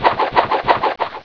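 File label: BOOMERANG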